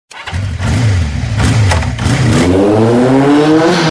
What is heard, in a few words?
Racer Bike